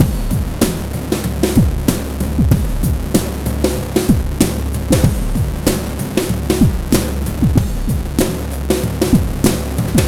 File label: DOOM BOOM 95.2 bpm; Planet of the Breaks